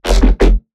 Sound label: Percussive Bass Phrase